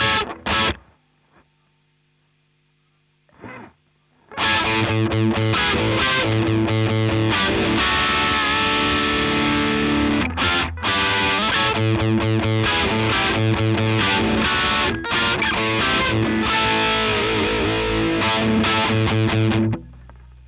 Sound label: Gtr; Marshal